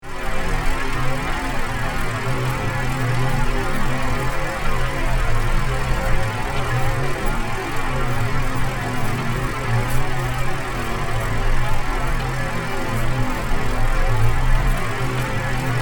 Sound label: time travel; タイムマシン１